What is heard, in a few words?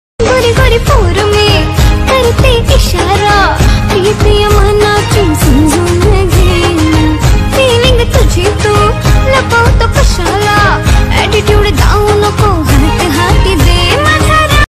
marathi ringtone, marathi bgm ringtone, ringing tone